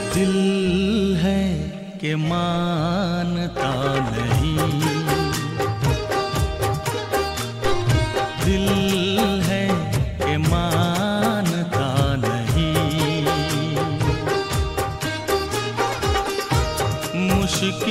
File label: Song Ringtone